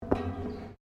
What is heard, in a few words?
Footsteps Boots Metal Spiral Stairs Steps; Footstep Foley; Footsteps (Sound Effects:Human)